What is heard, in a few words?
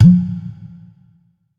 deep tom one shot f key; tom drum samples